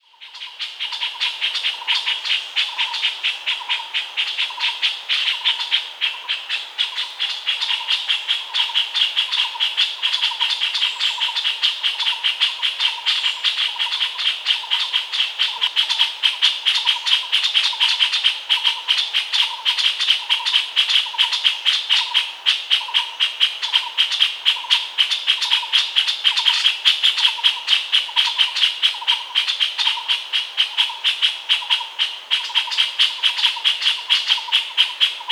Puff throated bulbul

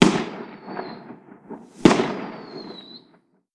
firework rockets explosions; mixkit

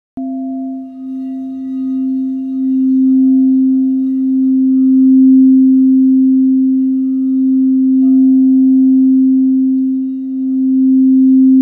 Root Chakra Music; موسیقی چاکرای ریشه

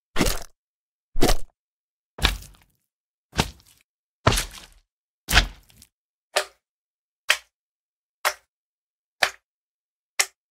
Slimes Minecraft; اسلایم در ماینکرافت

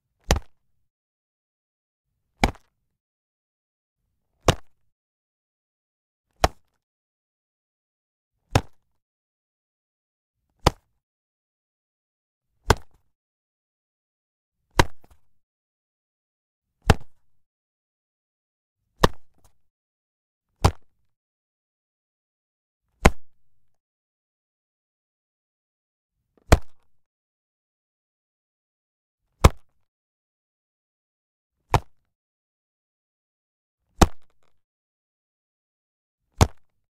baseball

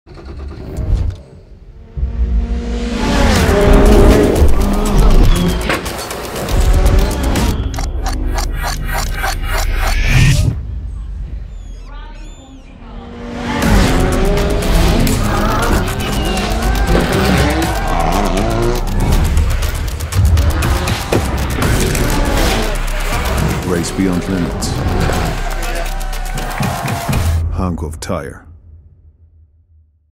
kmc, اگزوز ماشین

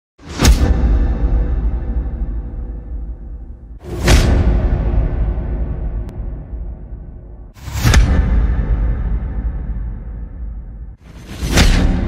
Iconic analog hit

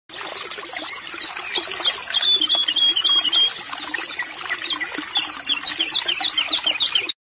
Beautiful Water And Nature

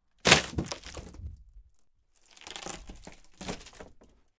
breaking wood; شکستن چوب

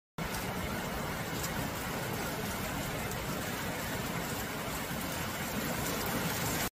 Rain on roof

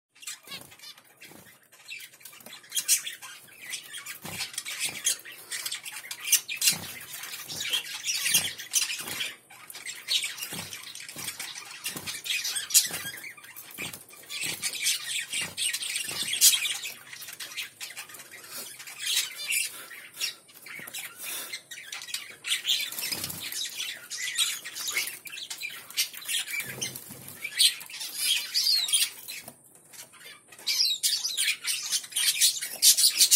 white finch sound

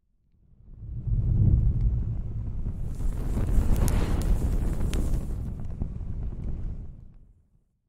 Paper burning; سوختن کاغذ

